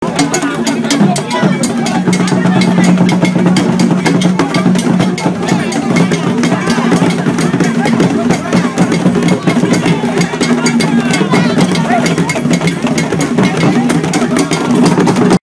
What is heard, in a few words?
Traditional Adowa dance form and music performance